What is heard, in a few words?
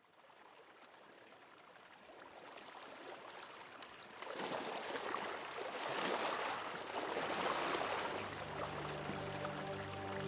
Nature & Ocean Waves; مدیتیشن